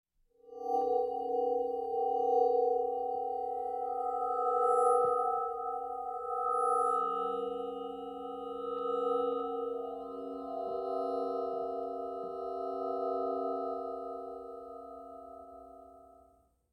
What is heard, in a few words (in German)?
Science Fiction Kristalline Energie